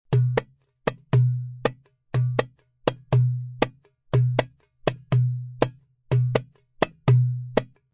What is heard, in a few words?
duyek slap